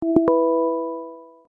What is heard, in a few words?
Outlook Reminder